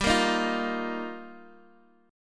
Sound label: G Minor 7th Sharp 5th, Guitar, Open D